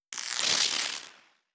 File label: Air